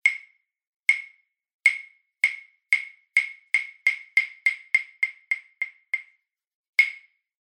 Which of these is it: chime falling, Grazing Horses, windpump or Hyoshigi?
Hyoshigi